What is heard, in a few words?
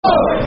Football Chants (Soccer Songs); Yobo; Everton Efc